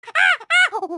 eek eek oop; Animal Sounds; Various Animals; Short calls

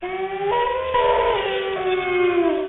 wolf howl; Animal Sounds; Various Animals; Short calls

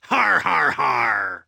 har har har

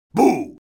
boo

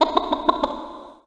chicken; Animal Sounds; Various Animals; Short calls

